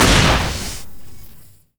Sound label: enemy explo mono; roboraid